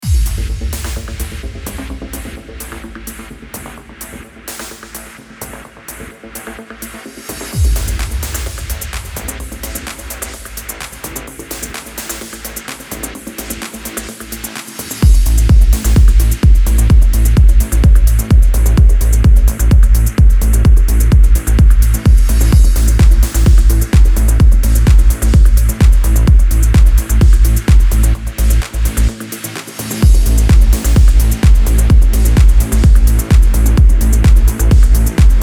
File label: Progressive Trance Percussion; AM Studios